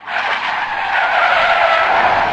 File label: skid, tire